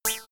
boing

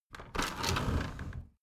window roller shutter open soft single pull

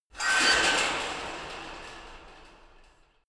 metal fence big rattle

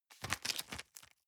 plastic bag small turn medium

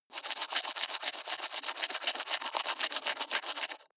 teeth brush wet open inward

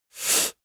inhale male

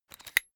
umbrella telescopic extend fast

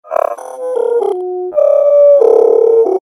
toy robotz melody

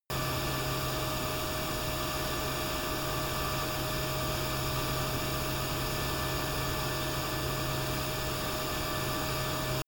military jet f-22 raptor turbine idle direct

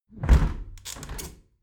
window close heavy sequence

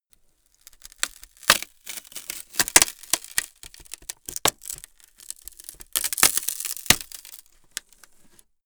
wood splinter low

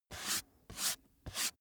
book dust off sequence

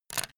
pen put down

